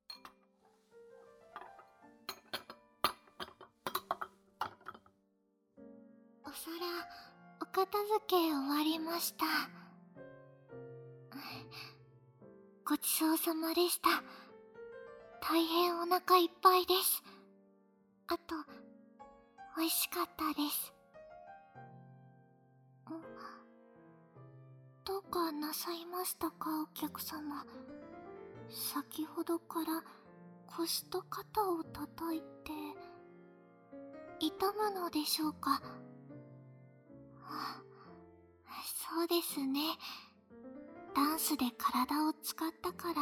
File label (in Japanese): すべすべ マッサージ